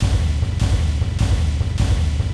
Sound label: steam loop, vehicles engine